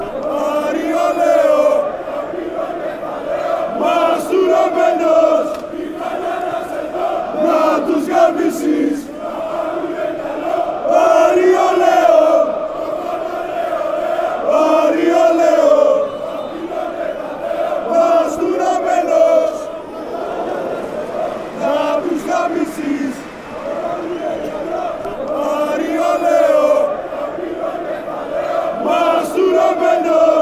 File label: aris chant